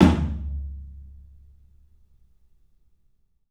TOM, 3D Drums, CLUB TOM; 3D Sound; CLUB 14TOM

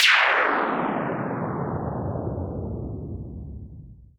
Sweeps Down

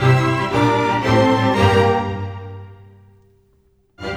Orchestra, Rock Pop

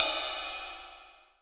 RIDE, DRY KIT; AKAI S6000 CD-ROM - Volume 1; DRUM RYTHM

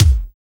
stomp kick; Drums In Your FACE!; NEWJACK

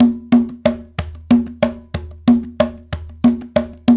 Bembe 6/8, World Dance

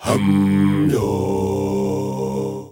Male Voice, African Voices

